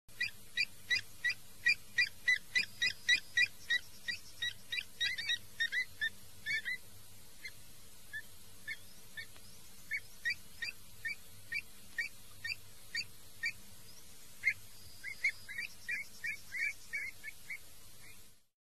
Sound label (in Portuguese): canto do avocet